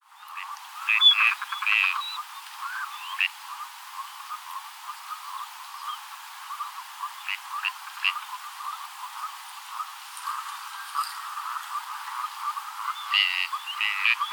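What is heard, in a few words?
gadwall voice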